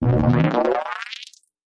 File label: Modulated Wavering Buzz Transition